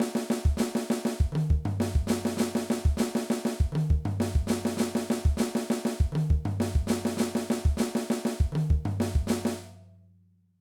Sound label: Flam Drum Fill